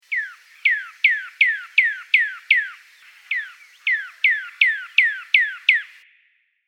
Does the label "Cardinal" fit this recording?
Yes